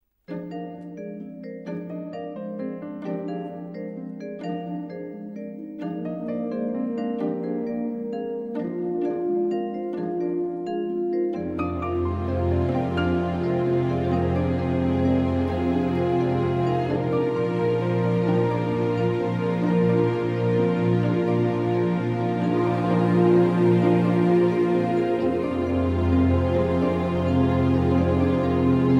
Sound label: Horn Chorale